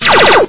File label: bang; bubble shooter